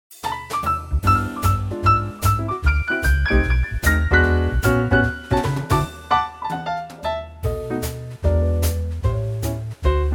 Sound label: D to Eb no guitar; no solo; key - D to Eb - vocal range - D to Eb; Fantastic Quartet arrangement of the old jazz classic.